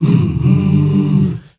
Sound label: MALE HUM, M. HUMMERS; Spectrasonics; Heart of Africa